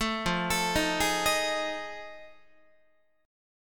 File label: F 7th Sharp 9th Guitar, chord; F7#9 arpeggiated; 12,13,12,13,12,14